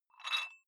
dumbbell take off rattling weight, fitnessstudio

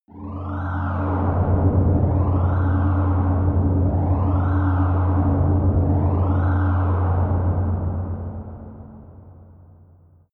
alien ship dark hallway; raumschiff

